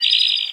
bird random; Level1 Ice Cliffs

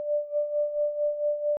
tuning fork

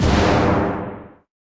Shockwave Element

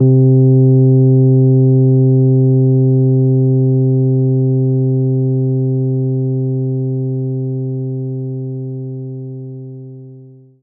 Rhodes C2; knights try

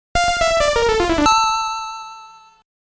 Coin In; HuCARD Disc In Taito vol. 1

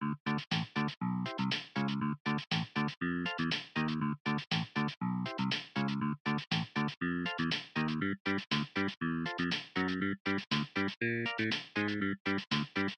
Crawcrane Idling, Slow

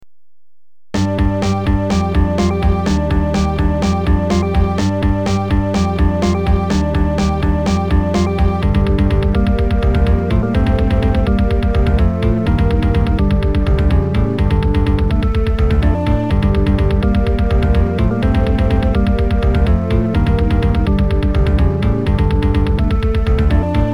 level 1 bgm; castlevania; level 6 bgm; Amiga

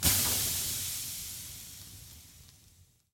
lava hiss; Scrat

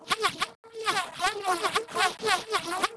Scrolling Fireflies; lego my style preschool

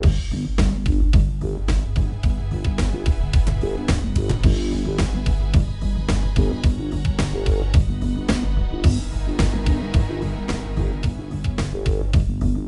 sounds music; Halo Combat Evolved; Xbox

